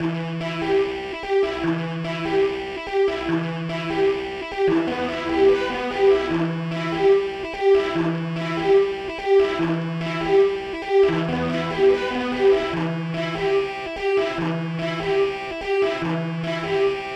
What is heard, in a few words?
Strings Loop